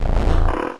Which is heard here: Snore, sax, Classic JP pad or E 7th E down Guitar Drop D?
Snore